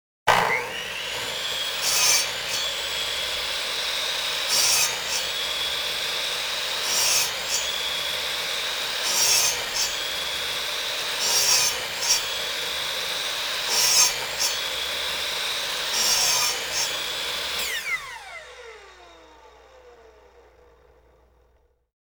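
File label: mitre saw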